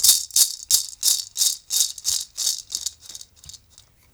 SHAK, shaker; Drumdrops In Dub VOL-1

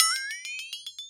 Flexa T lp, EMU Orbit-3 drum machine; 300 Drum Machines

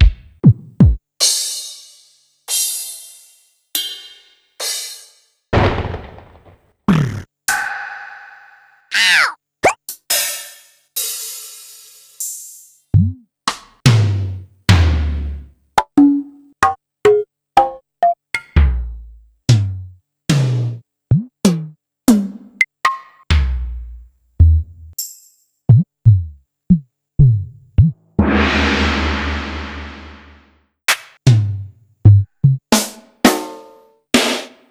Technics KN-2600

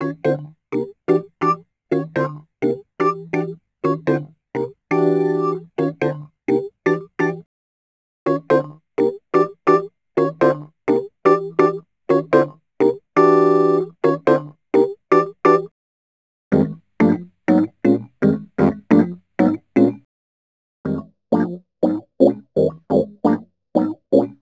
Rhodes Attacks; Ueberschall

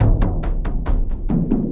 Dunga Dunga Doong Bunka, Frame Kendang Drums; Sonic Foundry (Sony Creative Software); Friendly Fire

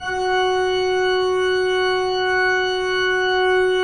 PIPE, 3DOrgans

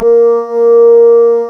Synclav FM, WIND, A#; Big Fish Audio

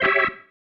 Stab, Organ, One Shots

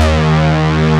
JUMP; East West; Global Sounds

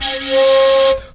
FLUTE LIN

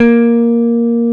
E BASS PH A2, Pick Bass

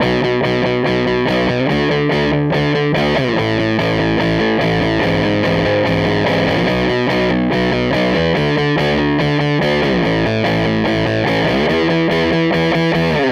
SG Guitar Loop

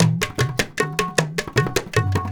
INDIA R, IND ENS PH, Heart of Asia

